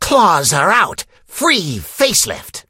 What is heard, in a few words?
hurt vo